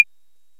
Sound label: ELECT PERC; OMI - Universe of Sounds